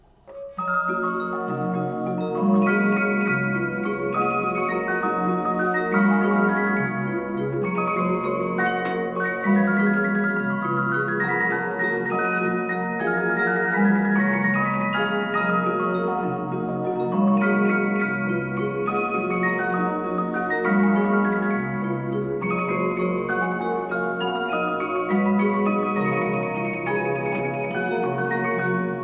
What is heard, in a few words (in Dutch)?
speeldozen; stereo 12 speeldozen